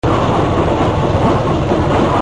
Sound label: Trolley Car